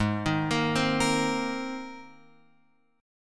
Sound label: G sharp Major Add 9th, Guitar Open F; G#add9 arpeggiated; JGuitar